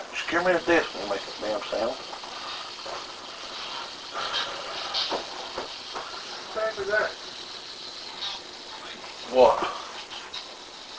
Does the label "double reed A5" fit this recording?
No